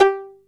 PIZZ G3; East West; Global Sounds

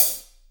HH hh; Best Service Gigapack 2

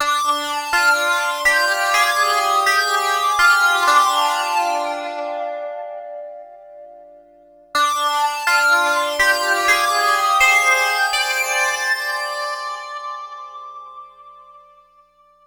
Guitar Based; Eclectic Electronica

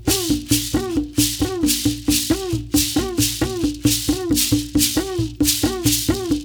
RITUAL PER, RITUALDNC; Spectrasonics; Heart of Africa 2

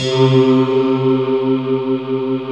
C3, Choir Acoustic & Synth; USB Soundscan vol.28 - Choir Acoustic & Synth; AKAI

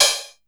hat, drum; Masterbits - Total Zone

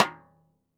xstick - snares off; JazzFunkKit

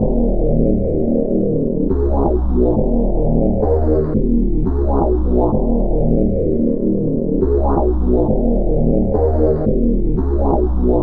D&B Synth Riff; neuro

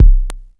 EURO TECHNO, loop; Sonic Foundry (Sony Creative Software) - Future Retro Dance Excursions

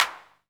CLAP, Dance Drums

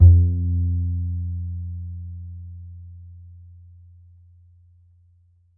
E, Upright Acoustic Bass; Larry Seyer; SWITCHABLE POSITIONS

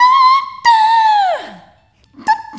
Rhythmic A

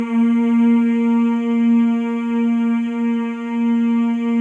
male; Spectrasonics; Symphony of Voices

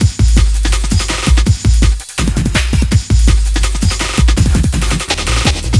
165, drum loops, drum & bass; Total Drum&Bass

